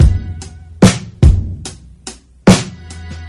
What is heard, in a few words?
faint strings, Boombap drum loops